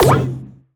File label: dissolve; ChillConnect